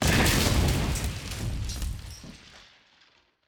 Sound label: plane explosion